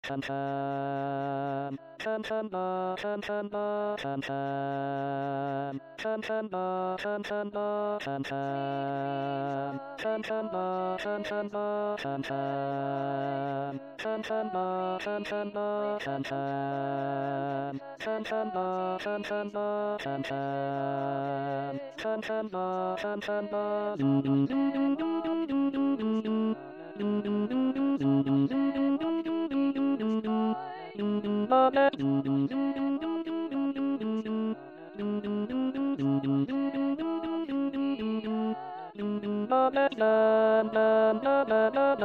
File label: bass